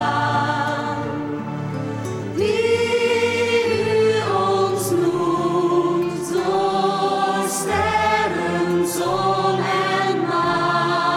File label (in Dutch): samenzang